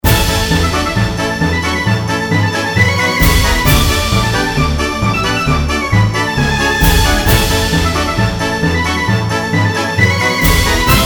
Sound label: progressive big winner music